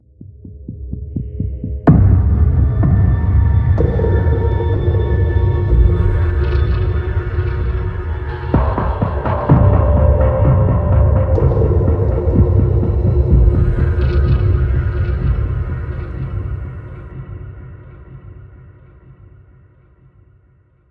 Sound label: Sneak